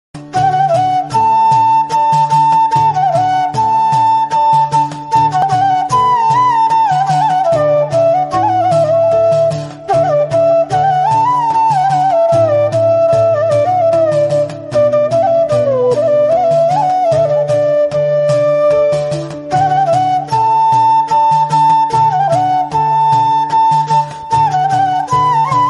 flute cover bgm, flute music bgm, flute cover instrumental ringtone